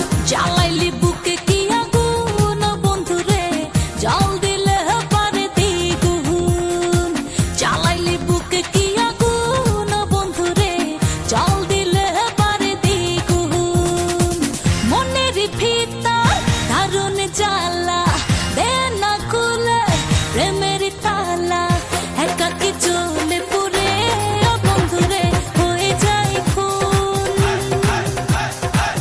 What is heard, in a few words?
Bangla Ring Tone